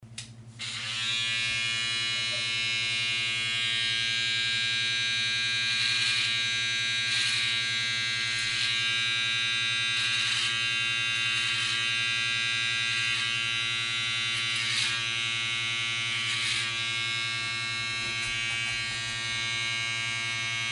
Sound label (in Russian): britie shei trimmerom